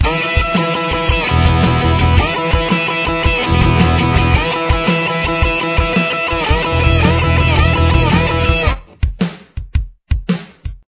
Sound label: solo